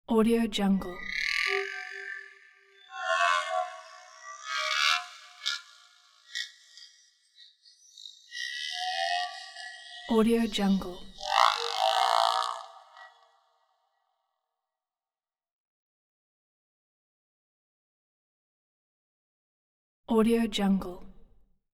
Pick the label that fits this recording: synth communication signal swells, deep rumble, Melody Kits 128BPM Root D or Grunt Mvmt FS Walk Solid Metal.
synth communication signal swells